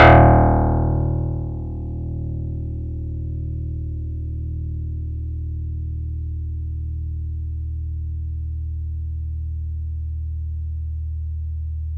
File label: Yamaha YX81Z Elec Bass d#2